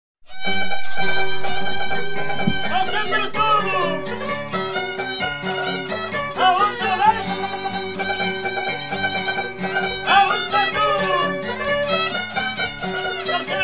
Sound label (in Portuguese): Folclore